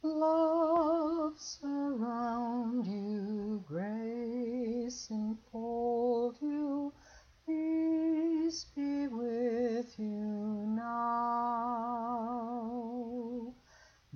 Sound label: Melody